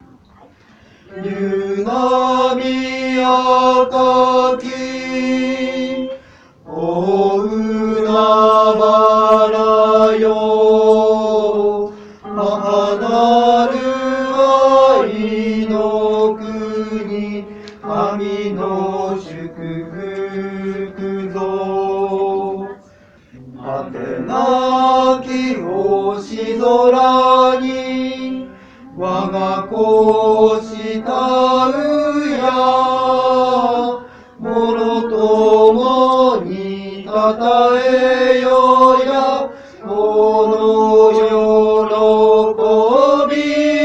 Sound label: tenor